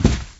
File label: Carpet Medium, zvuki shagov